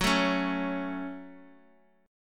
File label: F sharp Minor, Bass Standard; strummed; x,21,19,18